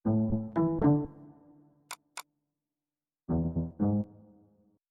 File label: Hardware Fail, Windows 7